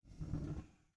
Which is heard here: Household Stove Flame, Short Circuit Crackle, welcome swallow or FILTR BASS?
Household Stove Flame